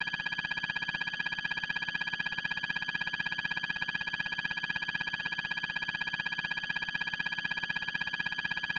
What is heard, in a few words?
Data Read Out With On Screen Text Artificial